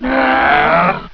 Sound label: wake, ogre